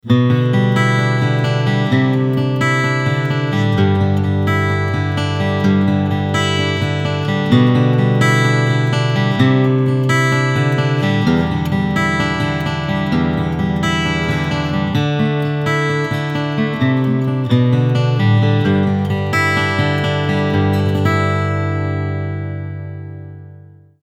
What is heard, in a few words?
Picking SM57